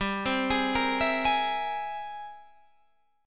Ab Augmented Major 9th G, guitar

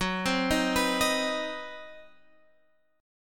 C Minor Major 7th Flat 5th F sharp, chord

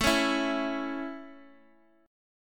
A Major, Guitar, DADGAD

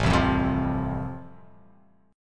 A Minor Sharp 5th A, chord; 5,3,3,5,5,x,x,x; strummed; JGuitar

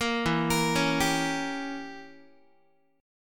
E Diminished 7th E, Guitar; Edim7 arpeggiated; JGuitar

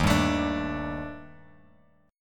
D sharp Major Sharp 11th, Bass Standard; D#M#11 strummed; JGuitar

